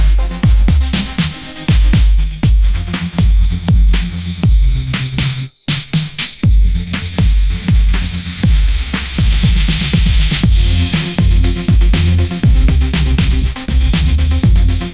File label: upbeat pop melody; ai